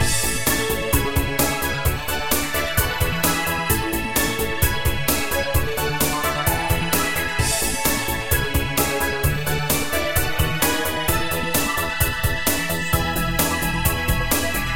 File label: Cartoon Intro Game Theme Loop